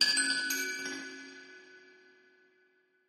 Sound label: Chinese Chimes Bells